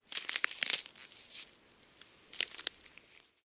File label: Ice crackles; SyrinScape SoundsScape Collection; bbh Haunting part 1; TTRPG